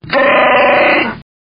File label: Chewbacca Wookie Noise